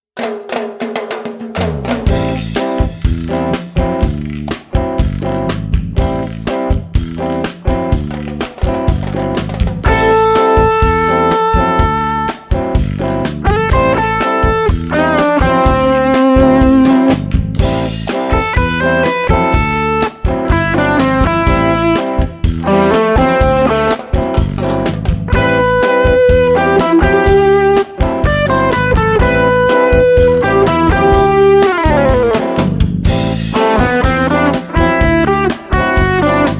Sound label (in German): Latin, E-Gitarre